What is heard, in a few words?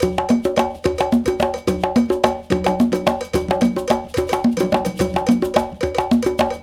Bembe 6 8, World Dance; Spectrasonics; Supreme Beats